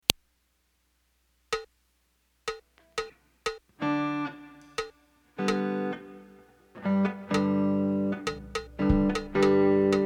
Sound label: Ambient Guitar Mix